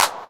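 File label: 909 clap; PERC TR; Wizoo - Platinum 24 Electronic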